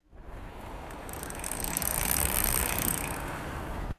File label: bicycle coasting past on road; vehicles